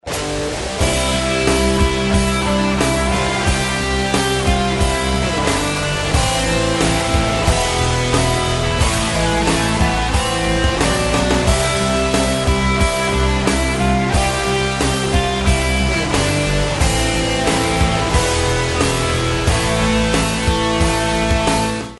ukraine rock version

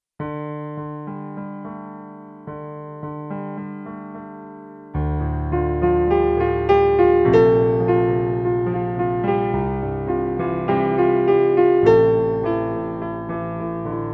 2 piano

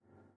silence

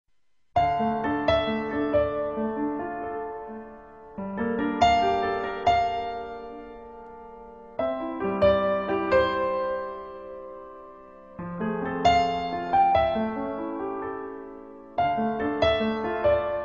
piano cover

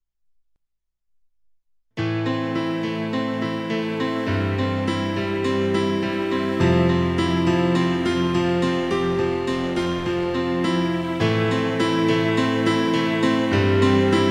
piano

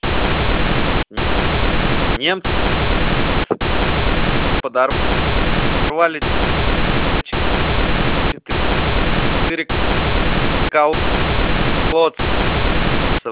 noize Shsh

